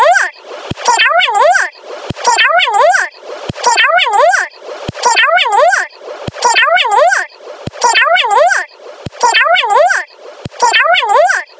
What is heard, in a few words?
recording 16k 2channel; 16khz voice data; usbd audio example in nrf5 sdk 17 02